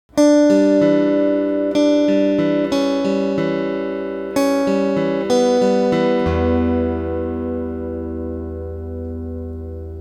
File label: Camp Approach Guitar; Firewatch